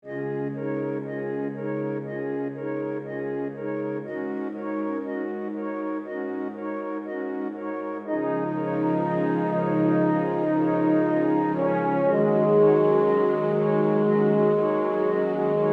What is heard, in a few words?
Orchestral Sky